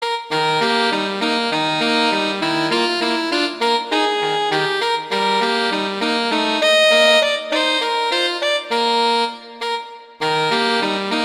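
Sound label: Duet, horn; Mozart; Duets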